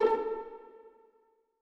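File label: orchestra; Masterbits - Switch FX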